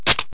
sodacan open; aroundhome

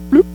BLOOP; Internet Pratique 01

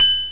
Warning; Frog Xing 1.1

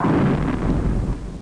A-10 Explosion; A-10 Attack! Cuba

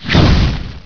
flying fist cast; Heretic II demo; weapons

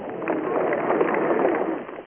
clap; cricket

